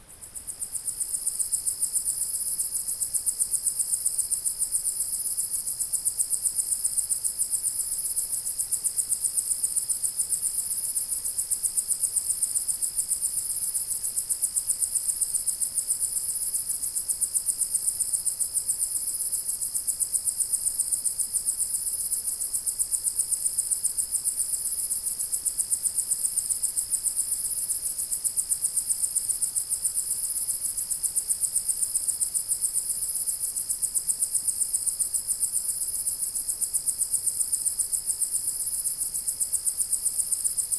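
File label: night ruled by crickets; noch vlastvuut sverchki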